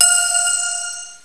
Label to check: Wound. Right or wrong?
Wrong